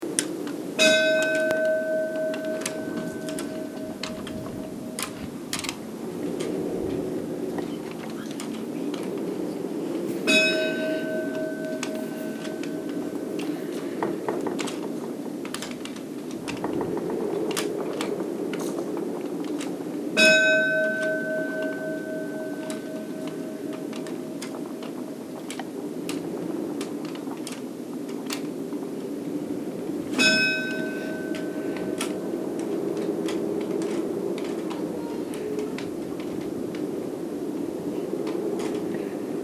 likhringing; stadarholskirkja

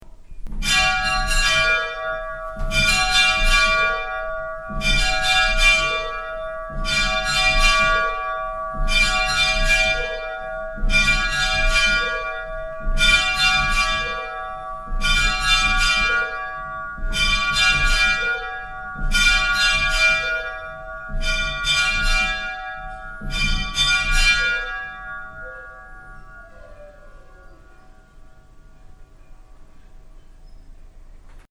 borgarkirkja badar, kirkjuklukkur

